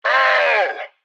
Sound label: hit yell; Blind Drive